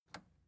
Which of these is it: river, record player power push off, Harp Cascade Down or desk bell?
record player power push off